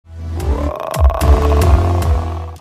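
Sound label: rock burp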